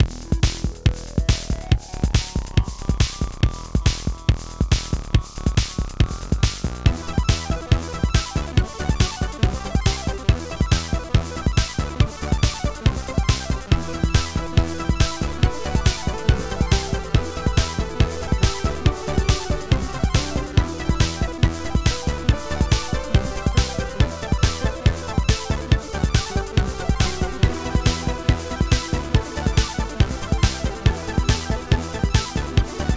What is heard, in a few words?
music Fight; Dreamball